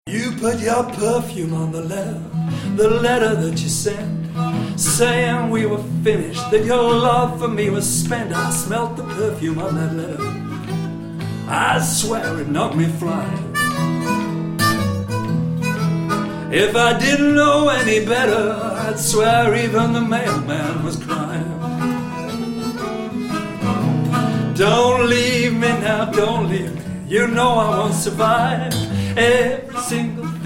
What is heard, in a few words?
Perfumed Letter Blues